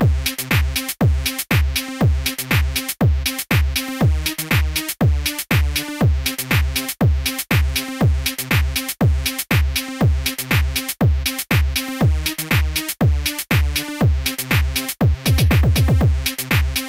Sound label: Aerobics; Dreamer Series Top Model; nds